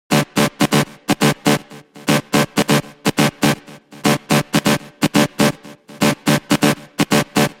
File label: Serum Grit